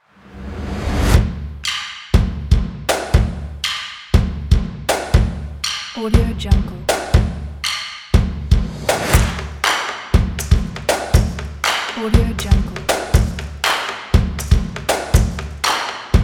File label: energetic percussion action claps and drums